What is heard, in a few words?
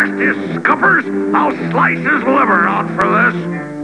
SLICE